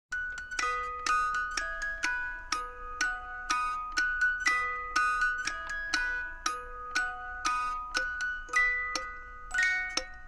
puppet music box; fnaf

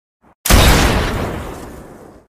pistol shot

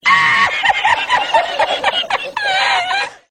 mexican laugh

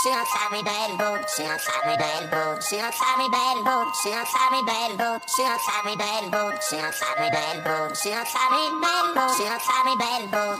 ice cream truck

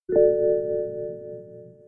bmw warning chime

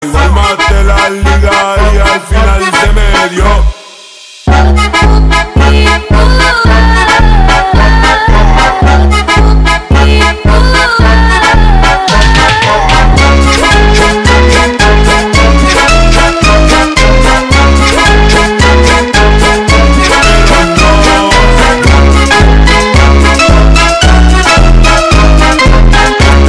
funk do bounce

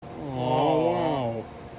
awww

